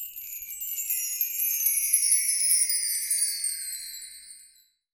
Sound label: BELLTREE; Sonic Reality; Interactive Drum Kits